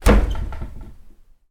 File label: household sideboard unit bash into wooden